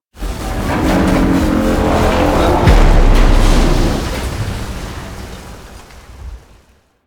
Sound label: Void Dragon Collapse; Warframe; Duviri